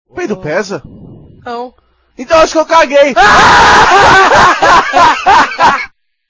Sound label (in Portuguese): peido